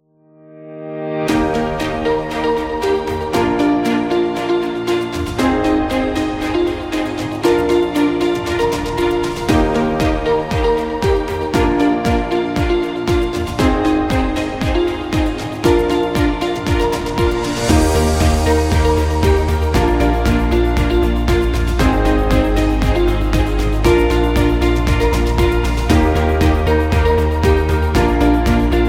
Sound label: Health Care Music; educative